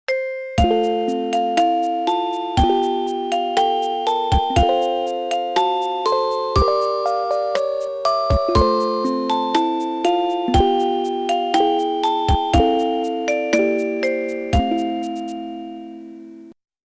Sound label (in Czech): melodie